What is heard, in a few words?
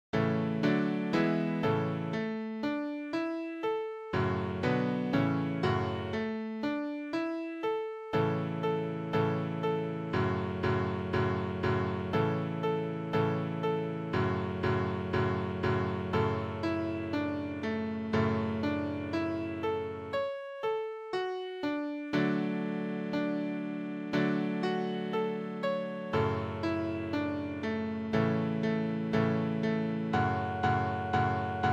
Second Harmony with Triads